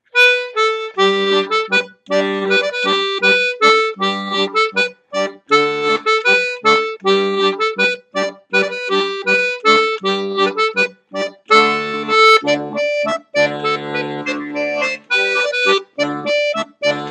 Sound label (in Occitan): Las perdes mélodie; Rondeau 2021